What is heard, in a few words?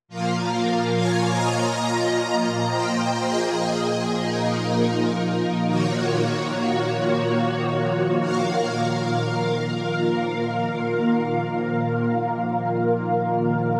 Chorus pad; Proton